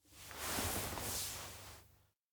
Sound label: pat head; rikka; anemoi